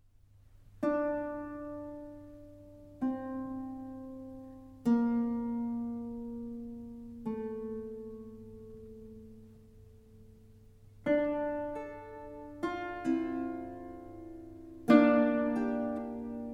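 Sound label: Baroque Harp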